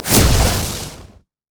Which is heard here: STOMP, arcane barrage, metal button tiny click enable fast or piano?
arcane barrage